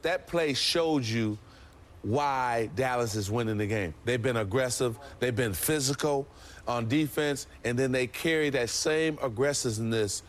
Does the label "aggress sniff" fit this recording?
Yes